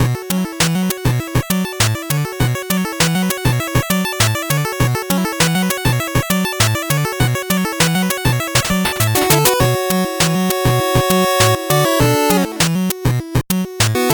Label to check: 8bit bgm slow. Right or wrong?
Right